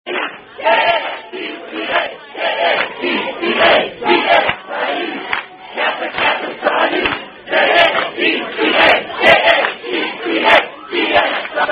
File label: Kappa Cheer, Unknown Kappa Psi Fraternity Chant